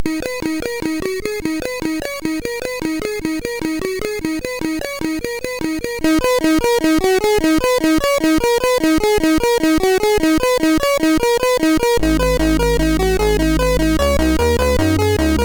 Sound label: sid, MOS6581R4; hvsc; Eloquence